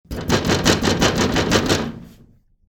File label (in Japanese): 教室の扉を叩く音; door